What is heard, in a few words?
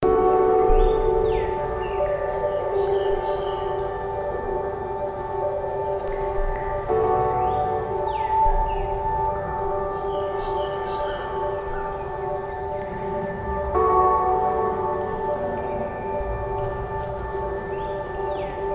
silent voice; pad